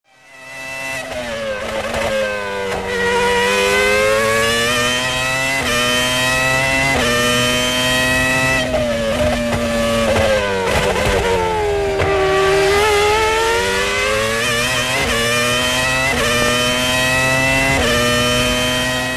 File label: Formula 1